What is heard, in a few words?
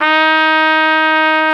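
trumpet, harmon dry; Roland L-CDX-03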